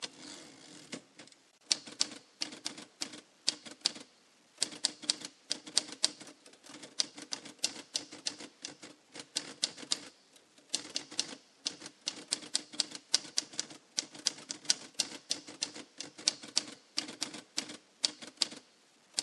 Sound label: typing; Daily Chthonicle